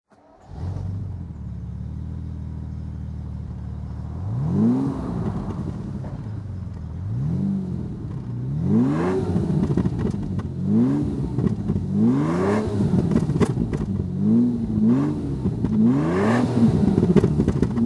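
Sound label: jaguar etype v12 fhc manual throttle, engine sound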